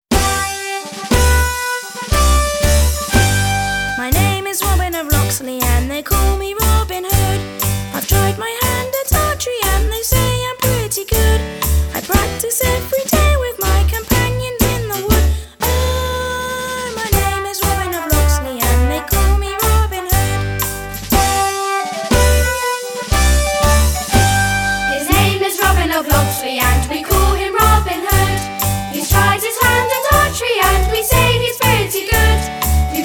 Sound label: Robin Of Locksley Vocal